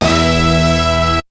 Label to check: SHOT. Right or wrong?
Right